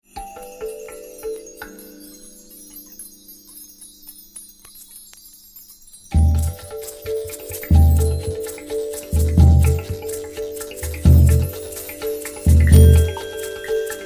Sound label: four drummers